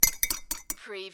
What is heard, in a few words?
Stirring with a spoon in a cocktail glass